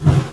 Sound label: torch; Odyssey